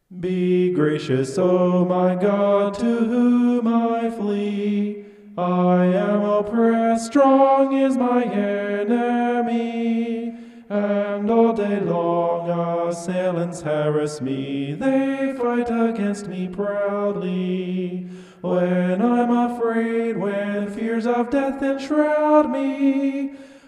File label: vocal homoph; psalm 56